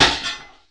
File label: Metal Glass Bits